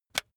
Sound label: razor attachment extend